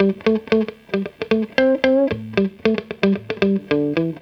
GTR, Roots Disco; USB Soundscan vol.32; AKAI

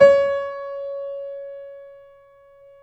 STEIN C#4, STEINWAY GRD; Greytsounds VOL-1

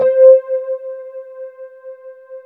WET RD C4 L, Keyboards Old School; USB Soundscan vol.09; AKAI; WET ROAD

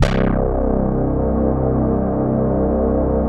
KITCHEN SYNK; Greytsounds; Scott Peers Sound Engineering

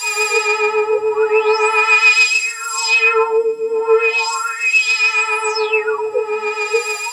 loop, techno atmos

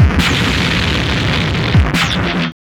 War FX, Hard & Loud Techno; USB Soundscan vol.01; AKAI

